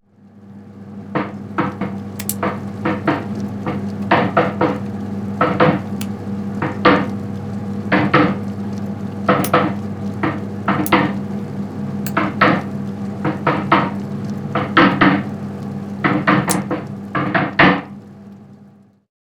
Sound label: boiler Tapping